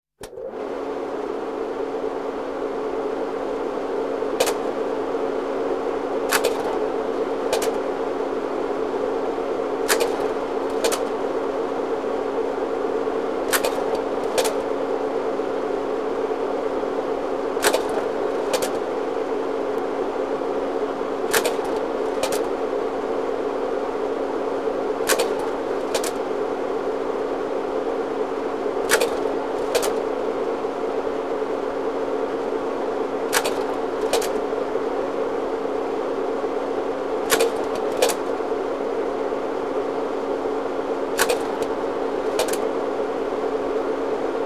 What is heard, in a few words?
Slide Projektor; KMP Film Video Sound Effects